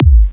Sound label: Kick Punchy Trance Kick